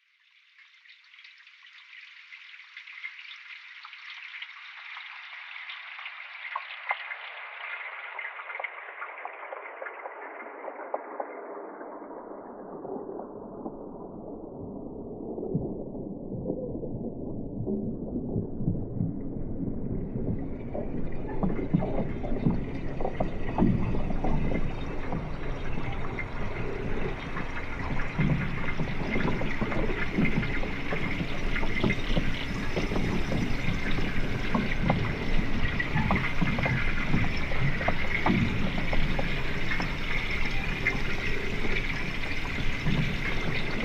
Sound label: Thames Comp Sketch